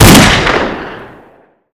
weapon; Deserthawk

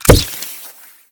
weapon; Default Zapper

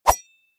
swsh

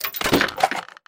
buy

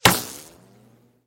impact; hit by projectile

